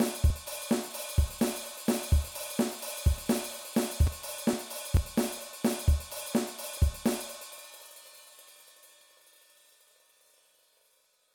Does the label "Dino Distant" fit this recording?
No